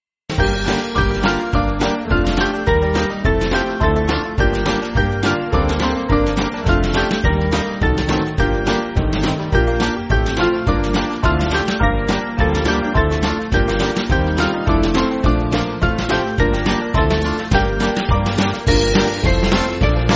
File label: Band, church music